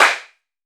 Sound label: clap, Drums & Perc; AKAI S6000 CD-ROM - Ultimate